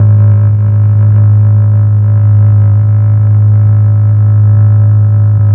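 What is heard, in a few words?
Mellotron Cello G#2, Slow Cello; Keyboards of The 60's and 70's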